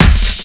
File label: COMBI, Houseworx; Ueberschall; Workz-9